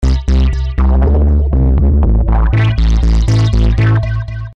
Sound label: Synthesizer parameters Effects Chorus Delay Distortion; Here’s the last patch with a good added dose of chorus, delay, and distortion.